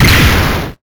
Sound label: weapon bomb explosion